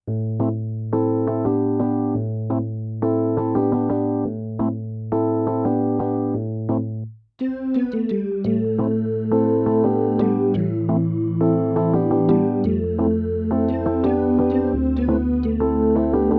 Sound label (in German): zwischenmusik